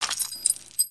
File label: idle rattle